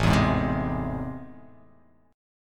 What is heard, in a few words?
A Minor Major 7th, down